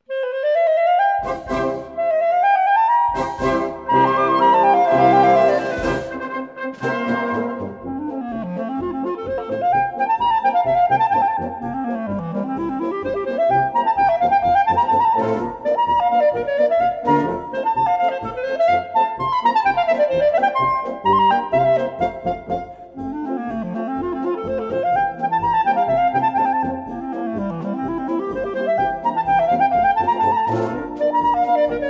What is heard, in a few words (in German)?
Virtuospolka